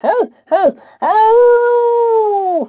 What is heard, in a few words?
howl, Dogz; WebFunPacks